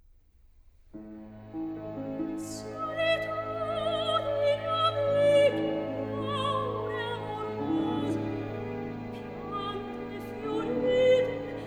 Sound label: opera, Recitativo